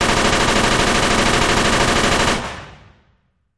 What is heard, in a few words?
Machine Gun Sngl Rap, single burst